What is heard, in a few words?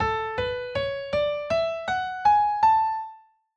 Major Scale A